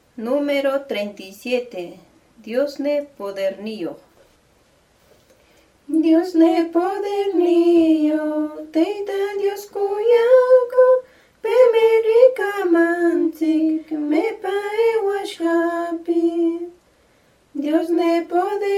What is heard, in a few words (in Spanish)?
cancion